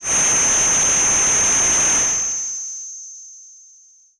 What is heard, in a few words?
Breath V DX100 G7